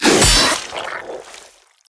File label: steel sword; battle